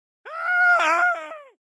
town human road; Blind Drive

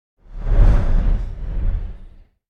Whoosh Deep Hover Heavy Bass; Hollywood Edge; sound designer's toolkit v2

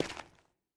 Footsteps; Hero; Blind Survivor

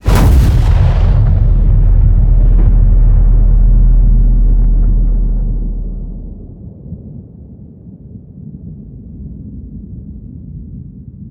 HIT Fire, Cinematic Hits Designed

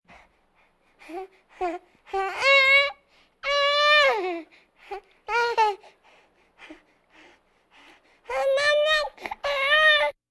Vocal effects breathing Moaning Baby

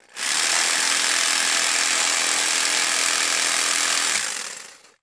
Jig Saw; Tools; 1001 Sound Effects

